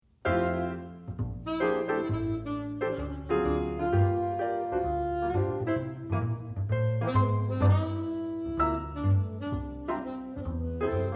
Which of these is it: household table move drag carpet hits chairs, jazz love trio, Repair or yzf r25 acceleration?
jazz love trio